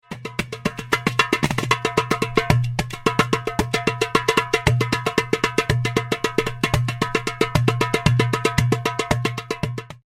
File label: membranophone